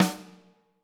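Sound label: snare drum, Drums; Bob Clearmountain Drums I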